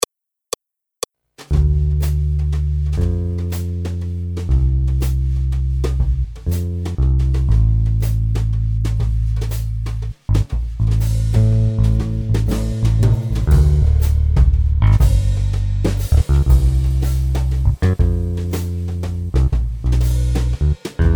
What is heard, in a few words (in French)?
Accompagnement seul